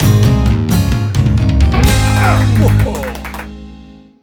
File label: Race Winner Song; Timberman VS; ps4